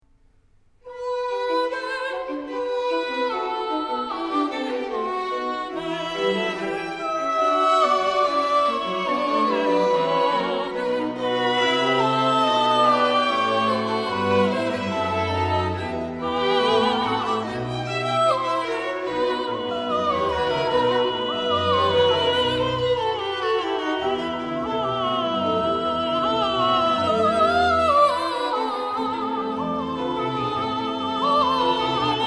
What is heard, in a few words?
Stabat Mater in F Minor 'Amen'; arranged by JS Bach BWV 1083 XIV; Giovanni Battista Pergolesi